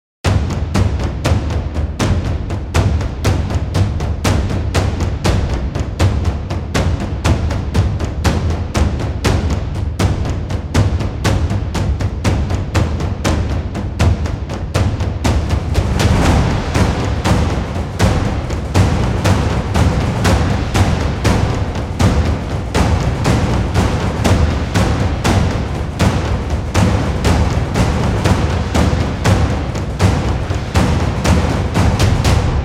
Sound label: Drums of war